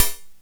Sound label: hh shake; opl3; M8